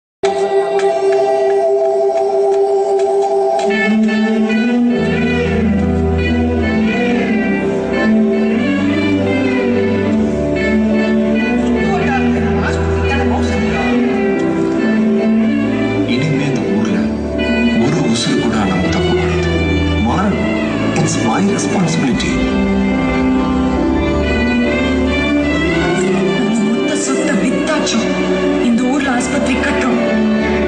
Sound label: sad bgm heart touching bgm sentimental bgm; mersal